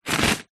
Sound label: leisure box movement table; lego